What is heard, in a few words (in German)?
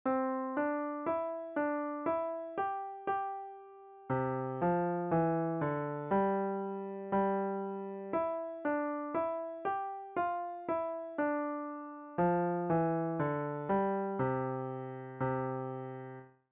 Marsch, Klavier